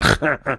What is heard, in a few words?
Laugh; Rise and Swine